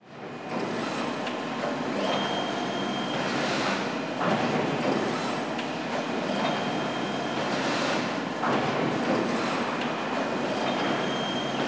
tyre factory floor factory ambience conveyor belt lifting arm